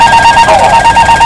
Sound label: A320 stall, B747; Aircraft; FS9; PF2K